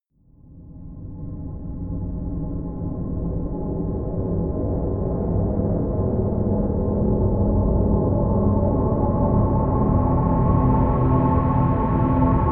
horror strings

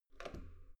metal door heavy handle up soft

